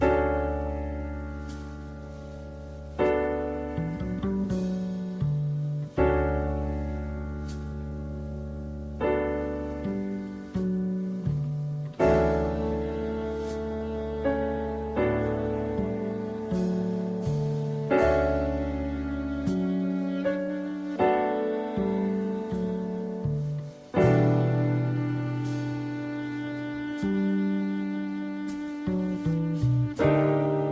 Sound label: rainy jazz